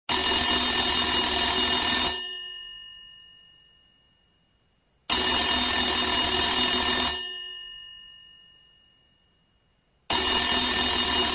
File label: Rotary Phone, ringtones; old phones